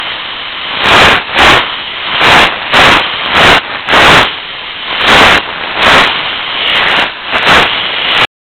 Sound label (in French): fuite